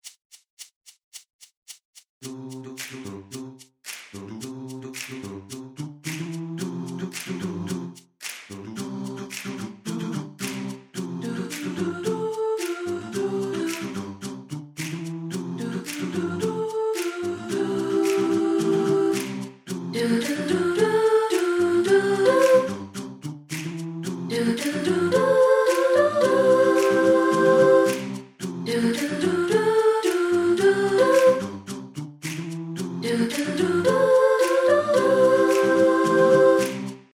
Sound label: Celebration Sopr